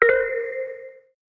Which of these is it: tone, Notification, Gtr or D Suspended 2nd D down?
Notification